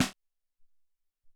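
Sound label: LoFi Snare, drums; Alesis SR-18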